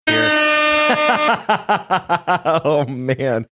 playing air horn drop laughing saying oh man

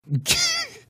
fast tee hee like sound

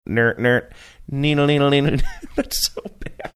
singing few notes laughing saying that's so bad

